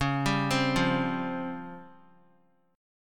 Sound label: C sharp Major 7th Flat 5th C sharp, Guitar; arpeggiated; chord